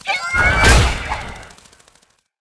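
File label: moth attack, monster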